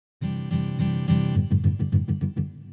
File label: Fill Ramping